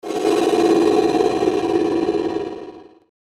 Chopper Stutter Dark; Blastwave; Chopper Sound Effects

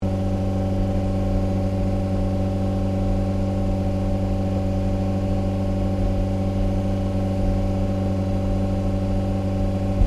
Car Ford Focus Idle High Exhaust; Ford Focus hire car; Cars; Vehicles

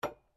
SLEDGEHAMMER METAL IMPACT LIGHT

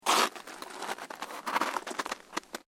SNOW CREAK

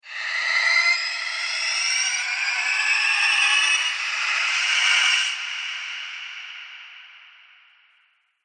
Horror Possession, evil; Twistedtracks

